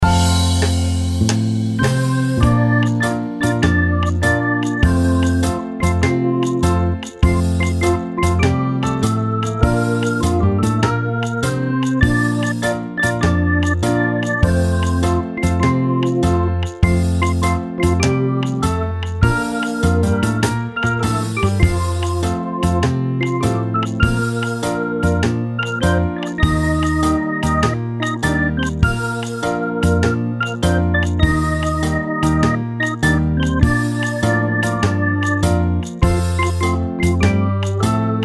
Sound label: Signalong Anthem backing